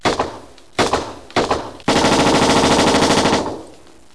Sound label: SHOOT, 射击; 特酷声音素材库